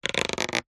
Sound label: cartoon stretch pull elastic